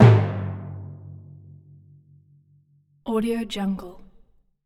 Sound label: kettle drum flam; blast drive 30; prosoundfx